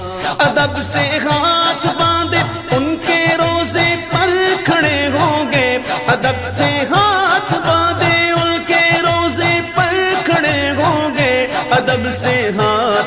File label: Islamic Ringtones